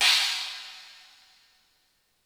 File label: Chines, Percussion; Giga Samples Collection; ELQ-DrumQit